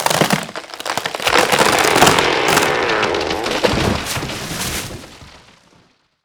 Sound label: tree collapse; mrk tree collapse